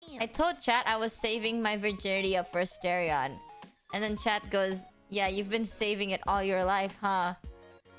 hah

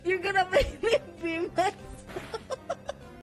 laughing

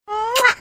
kiss xox

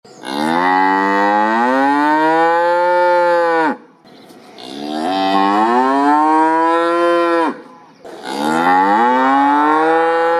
cows mooing

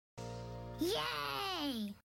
raccoon cute yay

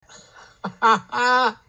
haha

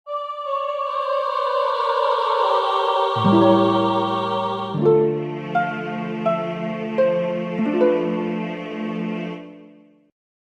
game over; twilight princess

